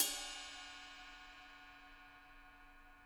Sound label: ride, Drum Kits; Sonic Reality; Interactive Drum Kits; 90s sample cds